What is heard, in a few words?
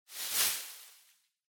Throw Streamers Noise